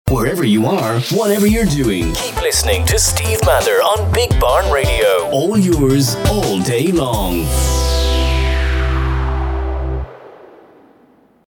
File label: station jingle